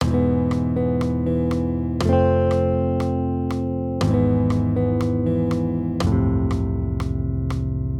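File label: Accompaniment, Major Triads, E-F, 120 BPM, guitar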